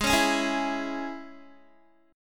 G sharp Suspended 4th Sharp 5th, G sharp, down, Guitar; strummed